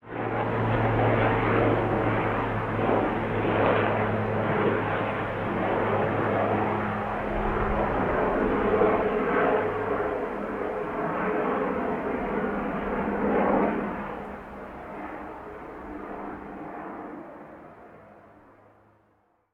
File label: airplane flyby; Golden Crayon Sounds